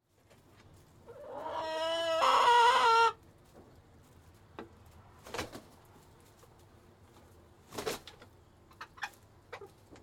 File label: Animal, Chicken, Squawk; Squawk 1; Chicken Sound Effects; Chickens (Sound Effects:Animals)